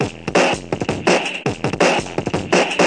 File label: 167 bpm jumpy jungle distorted, drum loops and breaks